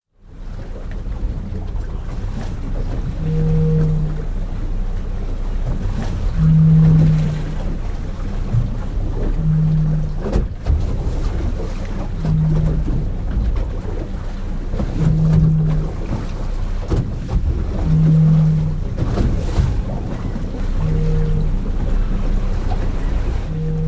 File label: Pine Tree Ledge Lighted Whistle Buoy